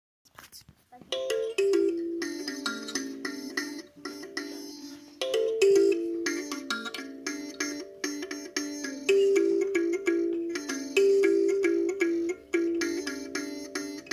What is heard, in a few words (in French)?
Piano à pouces